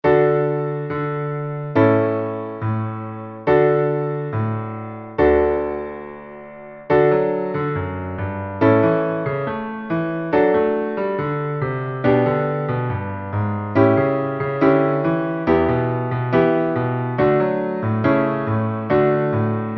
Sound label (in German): Luegenlied, Klavier